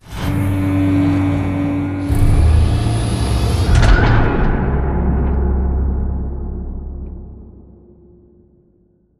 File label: Giant Floor Panel Assemble Rotate Mech; Boomtown; Sideways Town; Titanfall 2